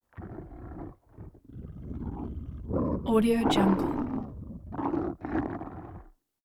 creature growls deep burbly breath grumble

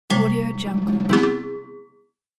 rattle glass game trial start

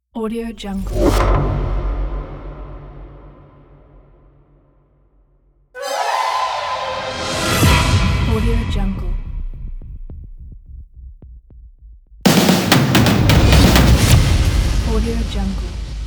cinematic transitions

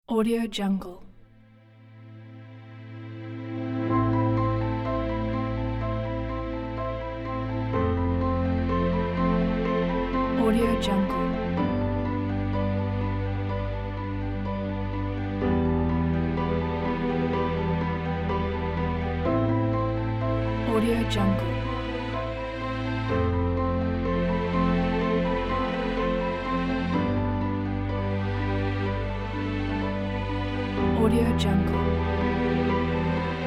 sadness pack

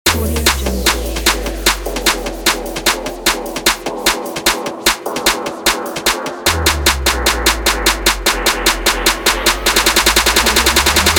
rap on pack

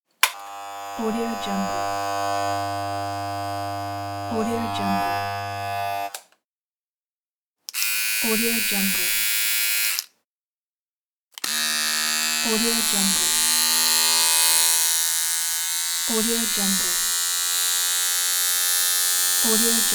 shavers

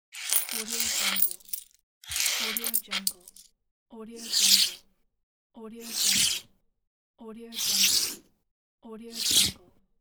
coins sliding on table

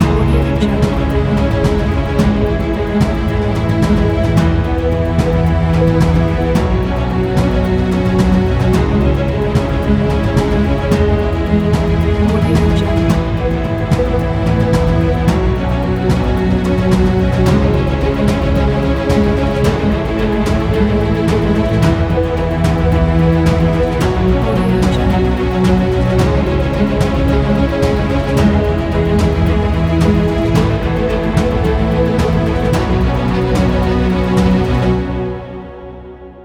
cinematic one day

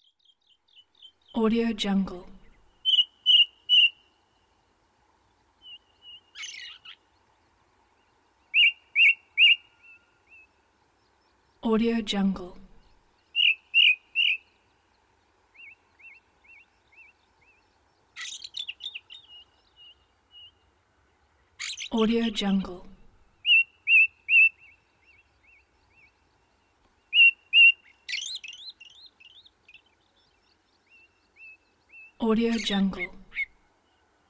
bird song

